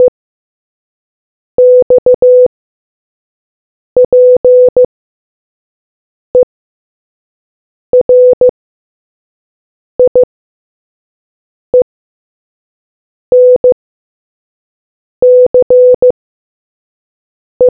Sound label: morse